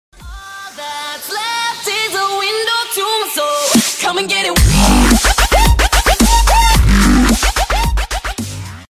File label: alert sound; twitch